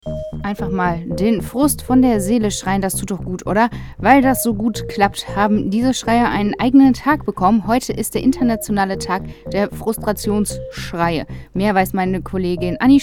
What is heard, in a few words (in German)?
Mit Schrei